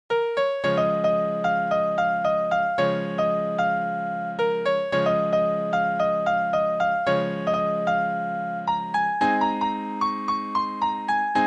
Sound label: Piano